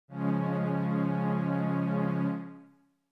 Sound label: Db Db Major